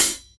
Natural Hat Sound A Key, hi-hat sample tuned to the A note